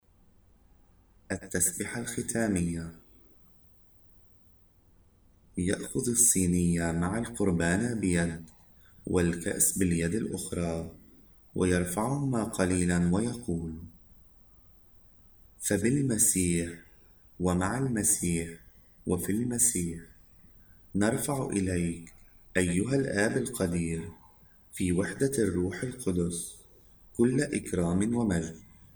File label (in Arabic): التسبحة الختامية